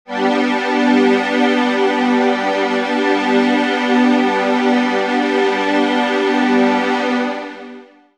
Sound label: Digi Pads; Jungle Frenzy 1